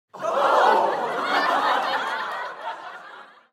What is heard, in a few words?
crowd shock